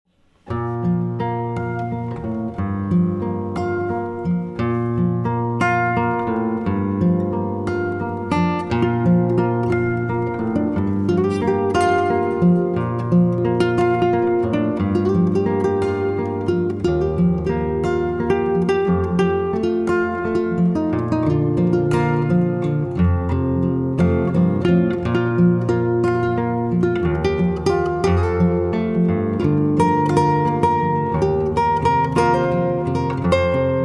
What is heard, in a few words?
(Instrumental)